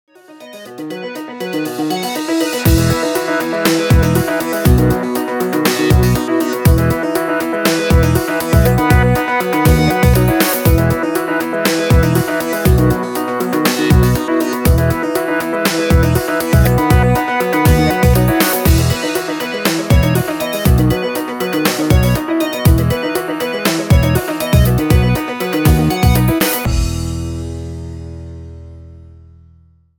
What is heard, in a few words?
PIANO